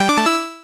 success

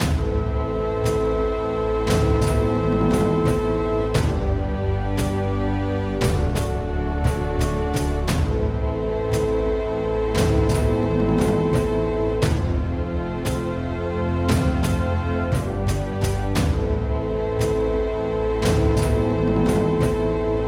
Village Ok Loop; skykeepers; ps4 windows xbox one gamerip 2017

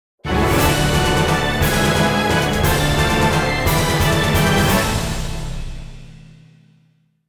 Music Menu event

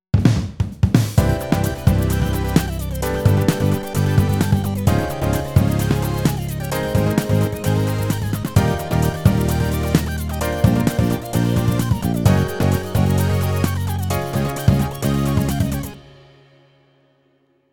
Win screen; super crush k.o.

